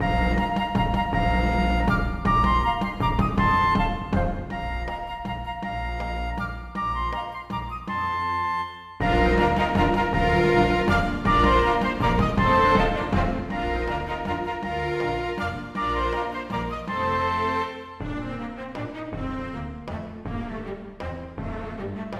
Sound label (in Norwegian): Menuet for fløyter, strykere og slagverk; Aamodt